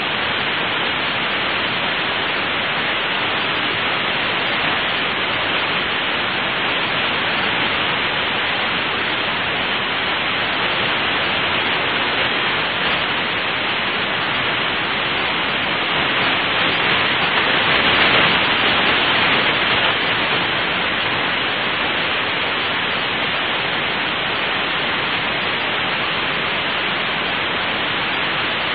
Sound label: jupiter l burst hf